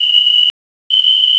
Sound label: bandpass stimuli